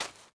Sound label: footsteps sand